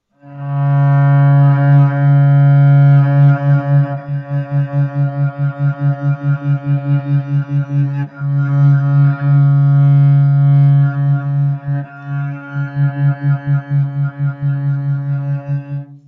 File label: wolftone